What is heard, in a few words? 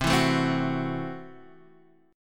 C 6th Flat 5th, C, down, Guitar, Half Step Down